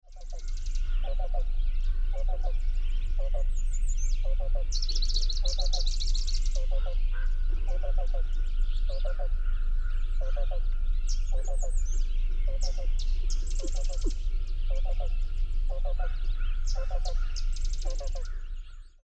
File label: Black-billed Cuckoo Sewage Ponds